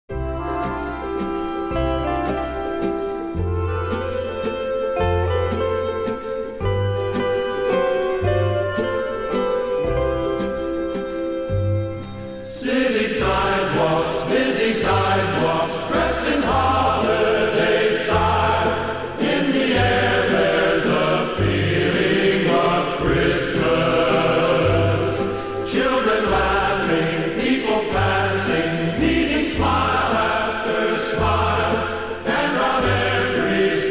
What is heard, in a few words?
Orch and Chorus